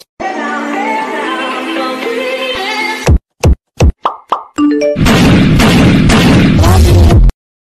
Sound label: Firing gun by hand; چالش شلیک تفنگ با دست